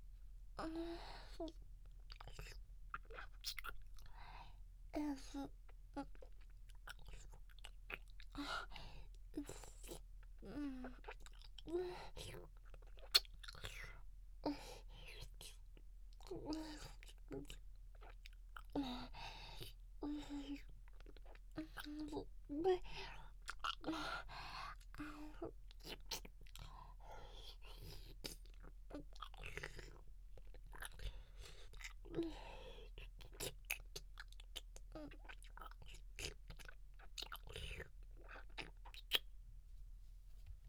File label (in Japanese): ゆっくり咥えるフェラ